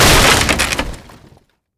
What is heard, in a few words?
standee break